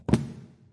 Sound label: book drop